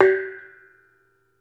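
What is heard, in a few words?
BONANG G3, Metalophones, Pitched Percussion; E-MU Producer Series Vol. 7 – Old World Instruments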